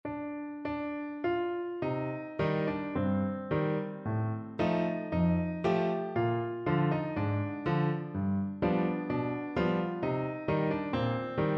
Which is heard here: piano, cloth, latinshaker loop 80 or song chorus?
piano